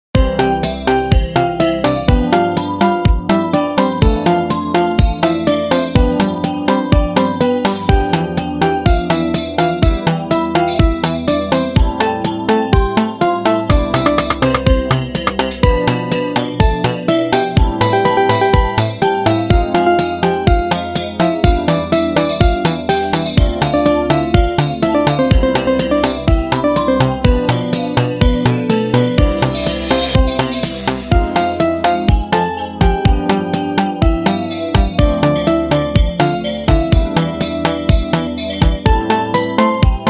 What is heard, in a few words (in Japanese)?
エレクトロニカ; インストゥルメンタル; 明るい